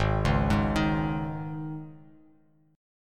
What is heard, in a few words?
Ab Suspended 4th, Bass Standard; Absus4 arpeggiated; 4,4,6,8; JGuitar